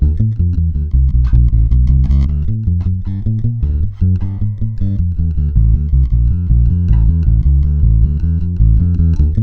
BLUES II, 156 BPM, Bass; Sampleheads; Ultra Freakin' Bass

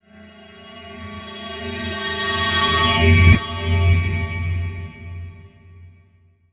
ORB SWEEP, RINGER, guitar; Spectrasonics; Bizarre Guitar

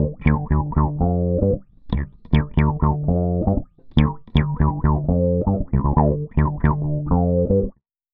DISCO BASS, 118 BPM, D

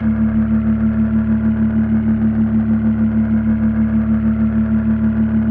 D1F, B3

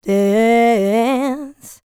licks, vocal, dance; ILIO; Vocal Planet

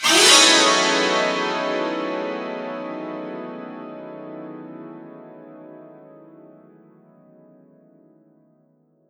STR, SANTOOR; Best Service ProSamples vol.52 - World Instruments 2; AKAI

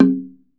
TUNG LO, African Perc; E-MU Producer Series Vol. 7 – Old World Instruments

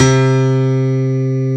PIANO C3; Big Fish Audio; Synth City

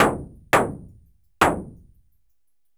loop 85; AMG - Vince Clarke Lucky Bastard

